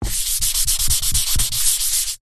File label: office chalkboard eraser duster rub